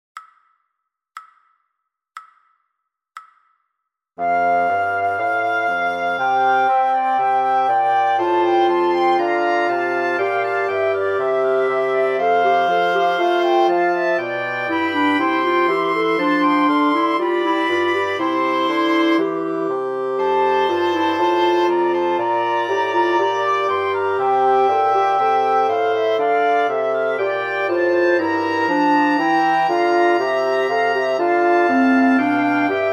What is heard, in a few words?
Quartet, recorder; Scarlatti